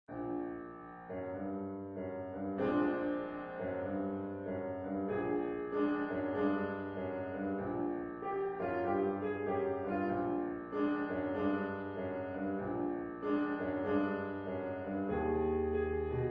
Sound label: Three Notes Blues